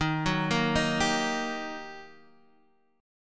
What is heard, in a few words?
G Minor Major 7th Sharp 5th Eb